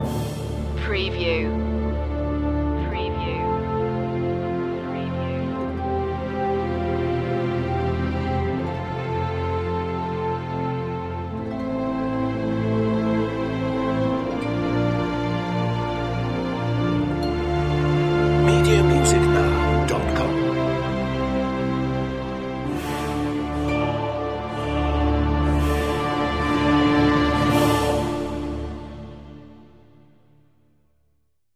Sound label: time traveler orchestral